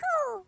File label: cool fast